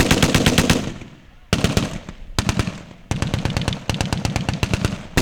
PRC RAT-A, Guns Tuned; RIFLE; Roland - Rhythm Section; Guns & Glass

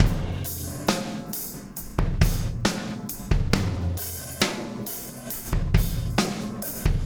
Impact Crater Beat, Processed Drumkits

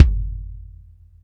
BD, Bassdrums, Roland TD-20

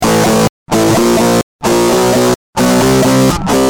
G Jam, Guitar; Industrial

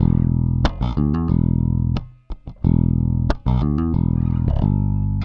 Rock, Bass; Bass X

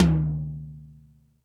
Toms 1, drum machine

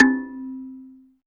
KALI 5TH F, KALIMBAS; Spectrasonics; Heart of Africa

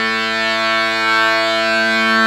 Accordians, Euro. Accord; Club-50 - Foundations Roland

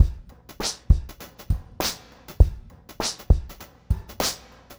Remixed Drums 100BPM; The Roots Drumloops

